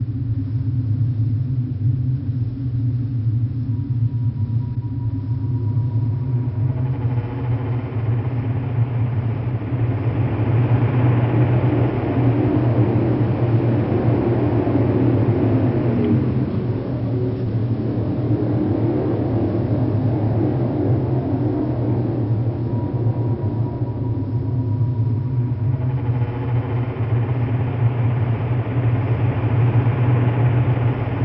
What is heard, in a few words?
Ambience dark 22Hz